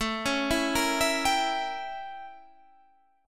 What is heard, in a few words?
A 7th Flat 9th Guitar Standard; A7b9 arpeggiated; JGuitar